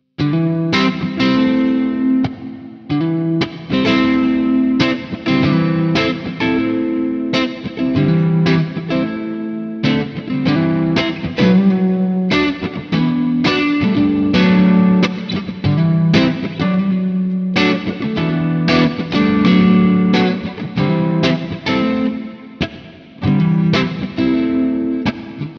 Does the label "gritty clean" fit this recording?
Yes